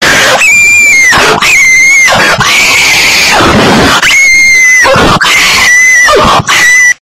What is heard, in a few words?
screaming